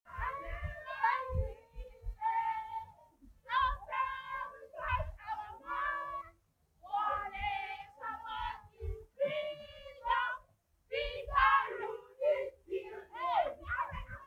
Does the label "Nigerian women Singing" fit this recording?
Yes